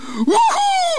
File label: wouhou